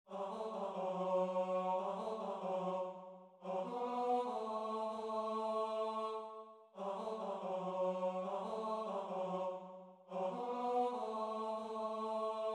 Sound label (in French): Tenor; L oiseau